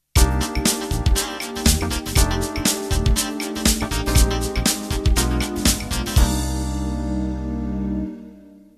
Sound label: Groove End